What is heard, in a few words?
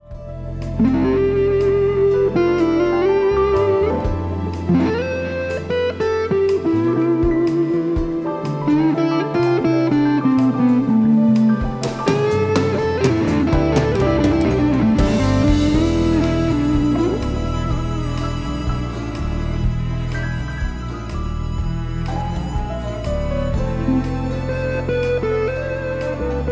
Les Paul Standard 1958; GIBSON LP STANDARD 1958